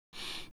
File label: br Medium, BreathWav; VOCALOID6 AI Megpoid SOLID Voicebank; Vocaloid 6.5.1